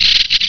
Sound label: cry